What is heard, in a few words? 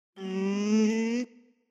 Varecia mew, lemur; The Audible Phylogeny of Lemurs